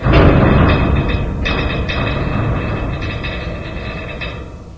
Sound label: groan; caged